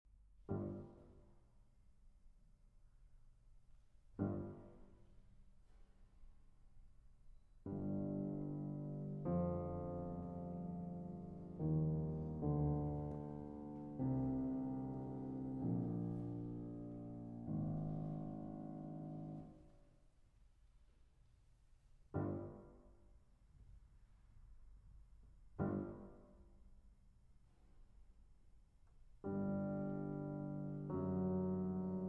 Piano Sonata in B Minor, Lento assai - Allegro energico